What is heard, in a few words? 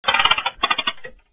crank